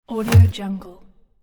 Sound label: transition impact sweep hit short; transitions and movement; ضربه رفت و برگشت